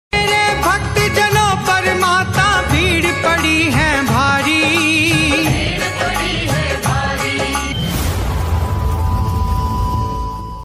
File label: ringtone bhakti ringtone; mataji ringtone; mata rani ringtone